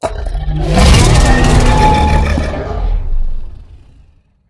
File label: idle roar; smite; tiamat